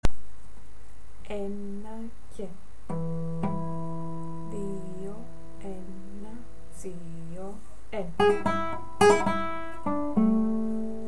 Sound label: tango